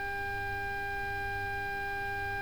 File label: Aircrafts Cockpits Avionics AC 400Hz; DCS